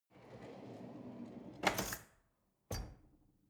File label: drawer cutlery close draw